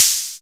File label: Cymbals HH Open; Vengeance Samples